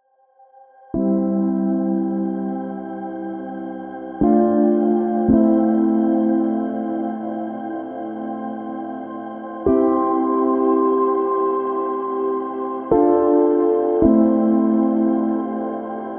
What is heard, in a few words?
Ambient loop; ZekeStudios